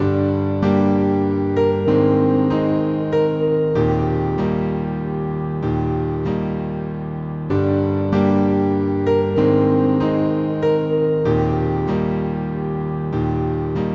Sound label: Piano Violin